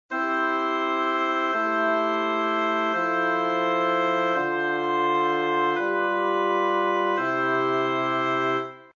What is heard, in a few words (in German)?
Harm Septakkorde; Harmonielehre